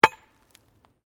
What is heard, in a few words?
Digging Pick Concrete Hard; Various digging tools and processes; Construction